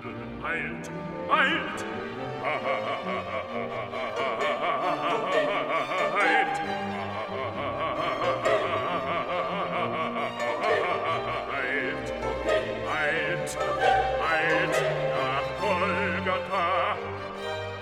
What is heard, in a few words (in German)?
SOPRAN, Stimmen mit Klavier; Johannes Passion